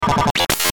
GLITCH STUTTER, Glitch Stutter Buzz Static; Production Element Imaging Element Accent Transition; Blastwave

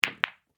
Sports Billiards Ball Hit Single Pool; SFX Bible 6

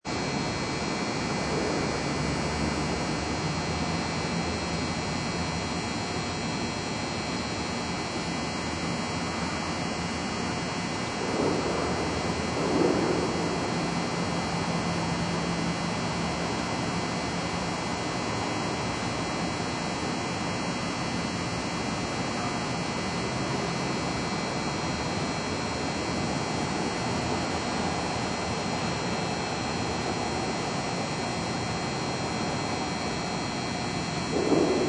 Sodium lamp CU, garage noises in bg; Lamps; Household

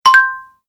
Message Notification; High Tech

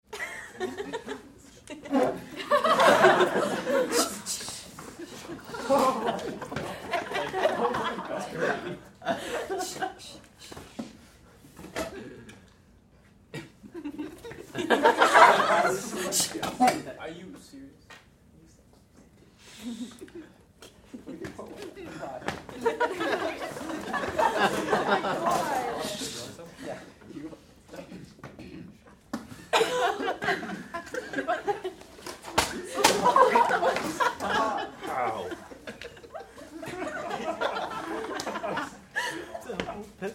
Crowd High School Class Clowning Around Laughs; Banter; Schools; Big Room Sound

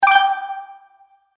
Magic Purchase Ding; Cartoon Sound Design

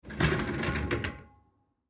Kitchen Chair Roll, Chair Roll Tile Floor; Chairs; Household

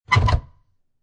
Cabinet Door Close Wooden

